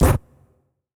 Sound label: sword swing